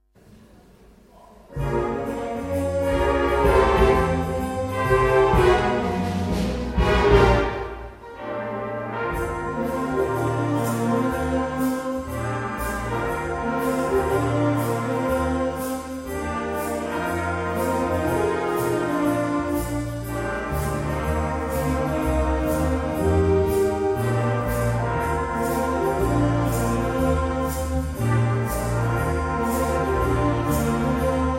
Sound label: Jazz Band